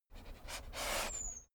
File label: dogo argentino whine snuff; natur-tiere; hunde